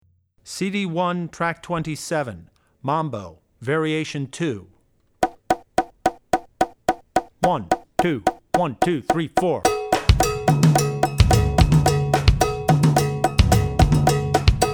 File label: Mambo (Variation 1); Drummer's Bible Tracks